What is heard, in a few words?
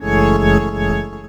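Pad Synth; Equipped Music; Loungin House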